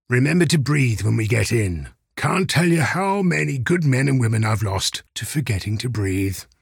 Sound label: breathe